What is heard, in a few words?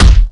punch boxing body hit; Left 4 Dead 2